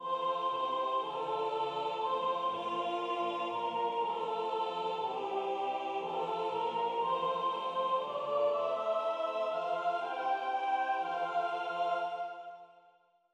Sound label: Revecy Rechant Soprano; Revecy venir du printans Rechant